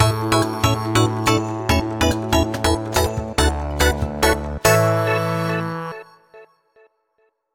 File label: Picross Completed; Picross Genesis Master System Edition